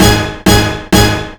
Orch Hit C4

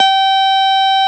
Jazz Guitar B4

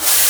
Brush Snare Swirl

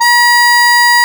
Harmonica D#4

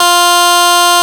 Clavinet A2

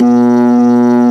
B3 Lo Dist Slow A1

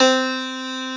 Piano Gb1

